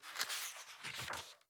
Book, Paper, Page Turn; Books; PMSFX; Books Alive

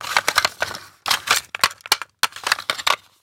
Audiotape Manipulation, Foley; Mechanical Wave - Foley Session 01

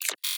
Mechanics, Computer Sound FX; SoundBits

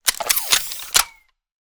Future Weapons 2 Source ammunition elements; SoundMorph

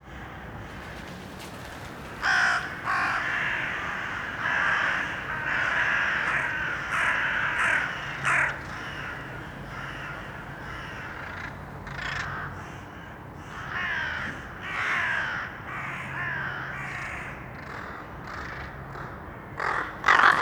Urban Crows; Detunized

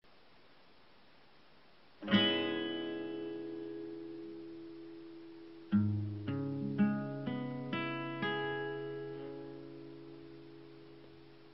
Accord C diese m7

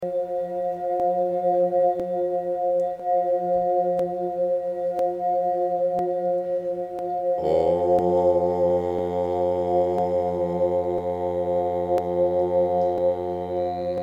gayatri mantra meditation

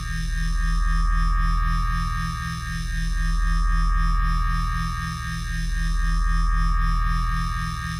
Force Field Loop; Digital Rain Lab - Lethal Energies

